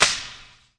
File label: verby clap esque; Claps